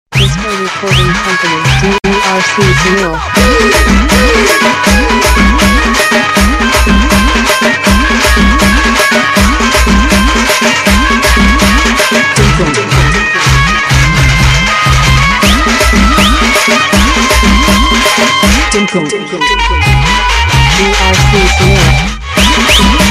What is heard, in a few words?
Piano Band, telugufolk